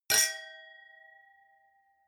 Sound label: wine glass different clink bounce medium; berufe; gastronomie; soundpool